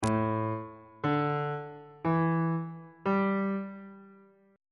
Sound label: a dominant 7th suspended 4th chord intervals on bass clef